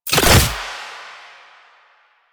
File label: Fuel Projectile Drop; JadeShadows; Ascension